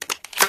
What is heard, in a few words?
shotgun reload; weapons; Garry's Mod; hl2